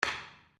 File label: Wood On Wood; Material components for constructing more complex sounds; Wood (Sound Effects:Materials); SoundEffects-Foundation4